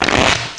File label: Shuffle, poker; iPoker 2000